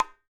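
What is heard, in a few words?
KHOL HEAD, DRUMS OF IND; AKAI S6000 CD-ROM; WorldSounds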